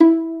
Violin Pizz E3; Instruments & Percussions; InVision Interactive; Lightware VOL-1

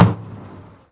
WATER TRUCK, percussive

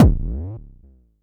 Mod bd, Jomox Airbase99

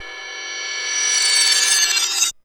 BackGlass; Total Drum&Bass; Weirdos

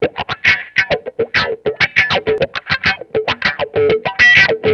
Bass, Promotional Loops; ESSENTIAL SOUNDS III; Sonic Foundry (Sony Creative Software); Not Just Another Pretty Bass

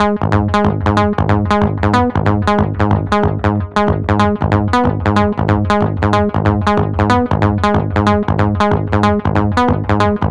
Arp Skip Loop, 93bpm; Zero-G - Soundclash Nu Dance Electro; Acidized Wavs; Construction Kits; Smooth Circuitry

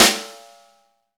snare, Roland; Club-50 - Foundations Roland; Ext.Snare 4

